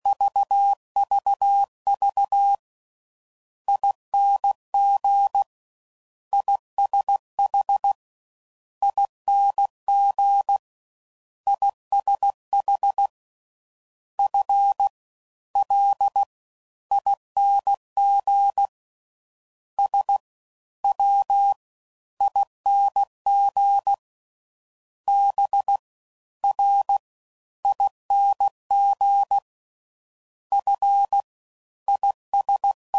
Jumps, CW Code Groups 5WPM; Morse Code

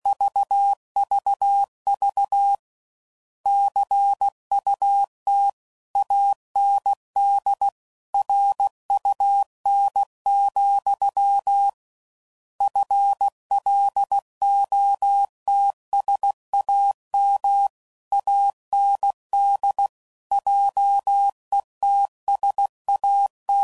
Word Pairs, CW Code Groups 5WPM; Morse Code

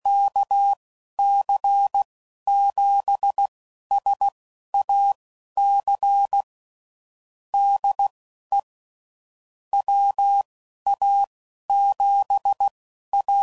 CW Code Groups 10WPM, QSO; HamRadio; Morse Code; kc7sac

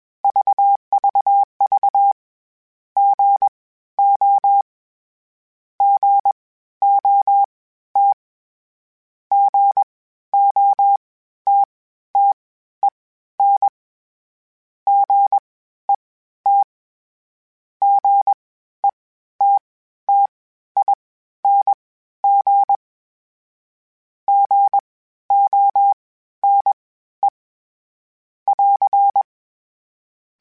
Morse Code, CW Code Groups; Mixed

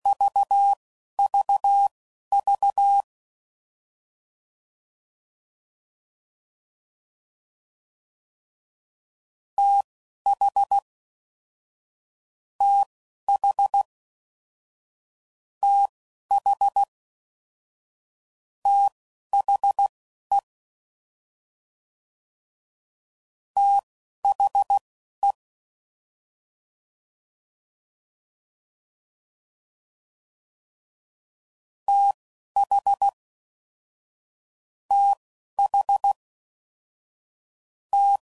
TH Prefixes, CW Code Groups 10WPM; Morse Code; Short Runs

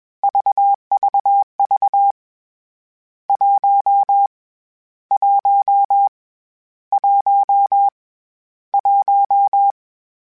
Morse Code, CW Code Groups; Mixed

